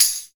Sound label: soft hit whistle; Azer+ 2023